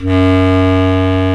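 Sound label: bass clarinet, Orchestral Winds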